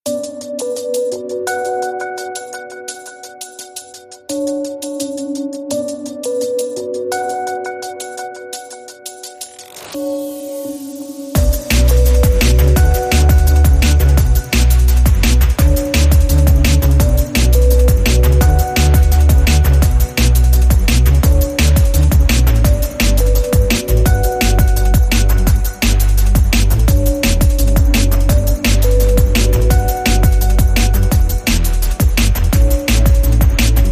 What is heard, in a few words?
Crackling Beats